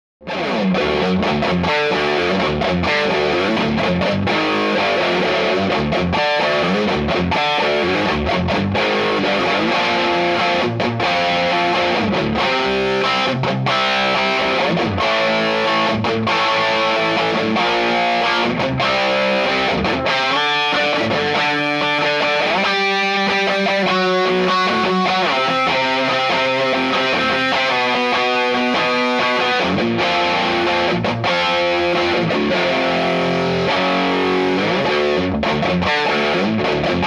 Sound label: Drop D Heavy